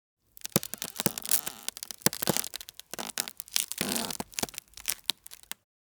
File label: Ice heavy pressure crack